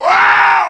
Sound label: VOX; ZERO-G; Datafile